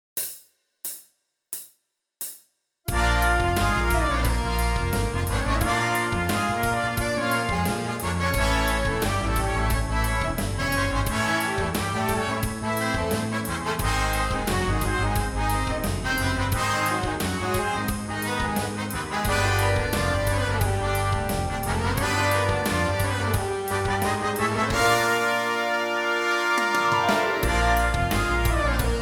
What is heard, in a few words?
Lip Slur